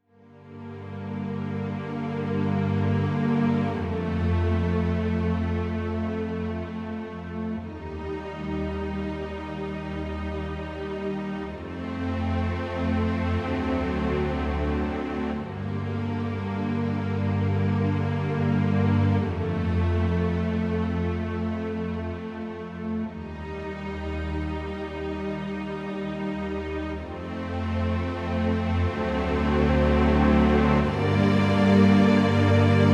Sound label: dramatic violin; By Burak Sound Bank